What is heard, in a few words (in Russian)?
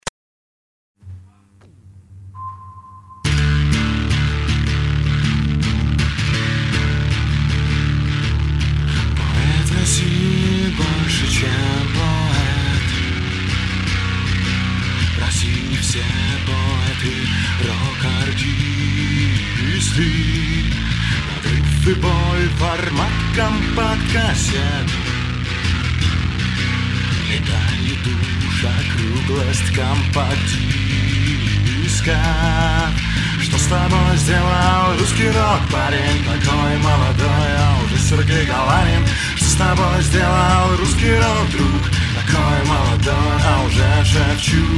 Русский рок (Bass)